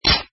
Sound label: wall hit sword; inlogic-castledefense